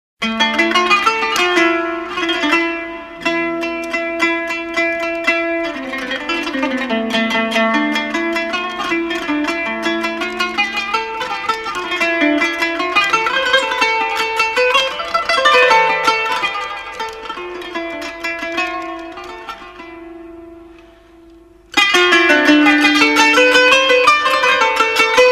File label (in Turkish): kanun taksimi